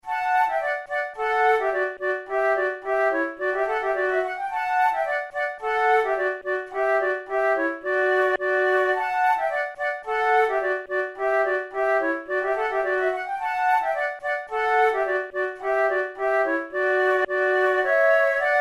quick step duet, flute

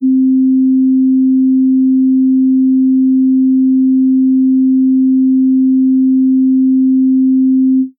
sine c4; flute harmonics

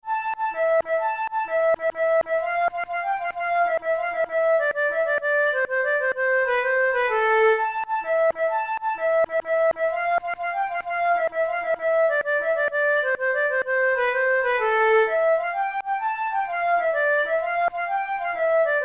tarantella, flute

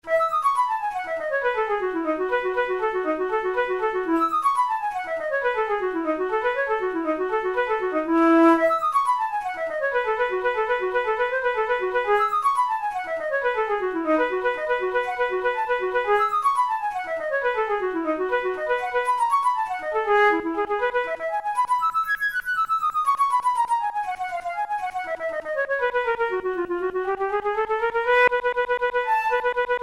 methode pour la flute study

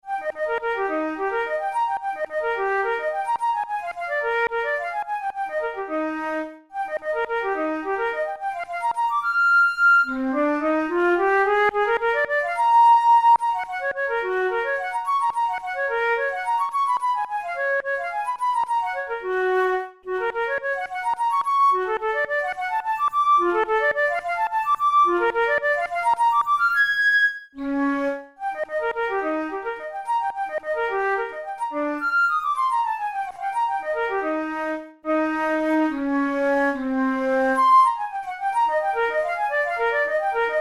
studies for the boehm flute; drouet 72

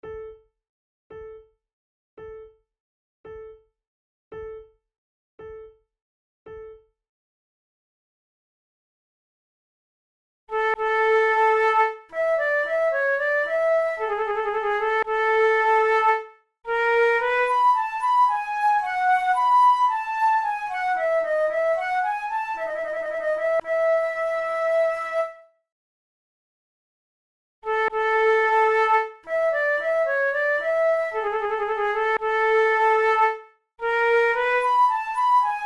allemande, flute

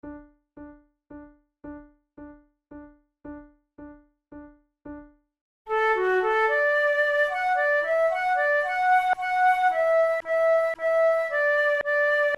duet, flute

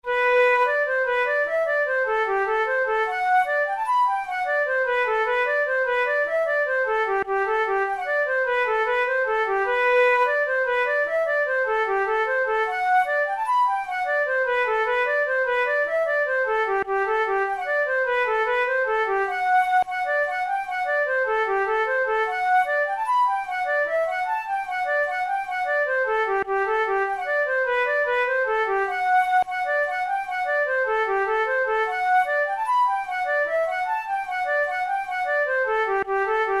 jig, flute

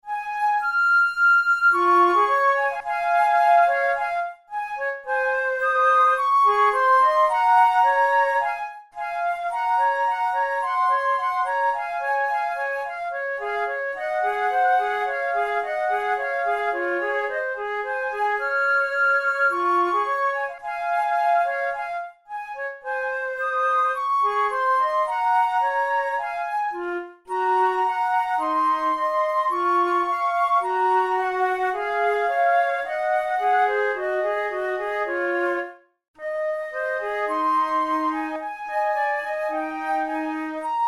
methode de flute; tulou

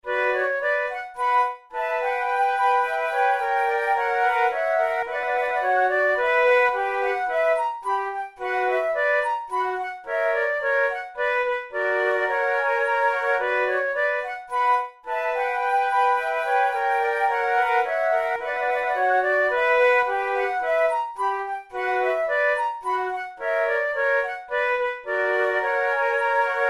minuet trio, flute

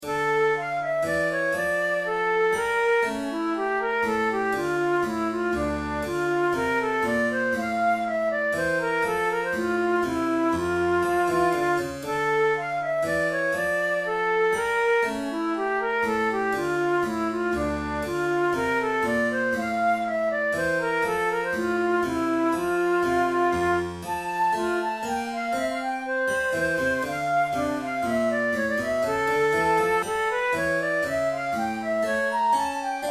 bach minuet in d minor, flute

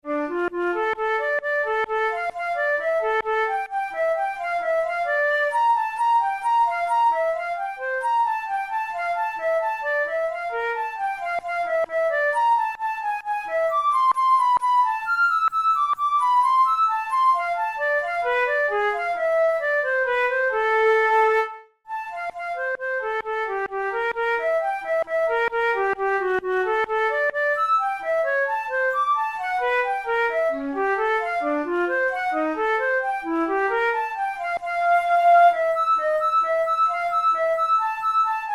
studies for flute solo; karg-elert

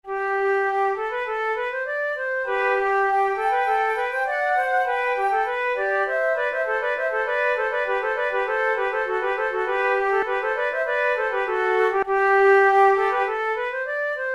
allegro, flute tunes; loeillet de gant op5 no5 ii

